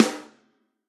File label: Snare 14x6.5 custom mahogany right hand crack